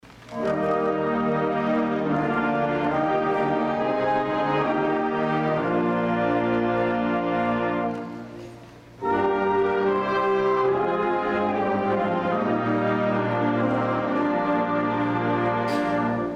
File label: Spring Concert Wind Ensemble Chorale